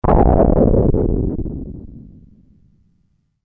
Thunders

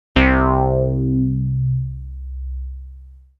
Moogli-Bass

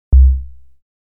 Dry Bass; instruments

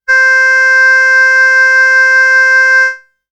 Oboe B

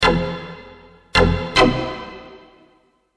Strings hit chords

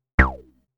Reso, synthlead

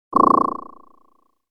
Swamp animal

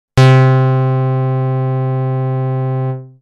Basic Pulse, synthlead

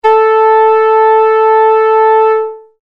Strings 440

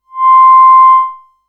Vocalist Simple

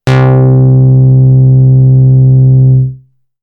Multiwave Lead, synthlead

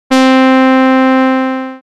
Dream Brass; instruments

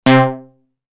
Multiwave Lead

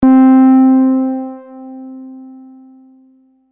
Wurlitzer

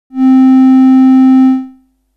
Tristar, synth lead

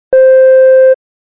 E-Organ

